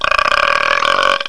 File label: owl talk; kingarth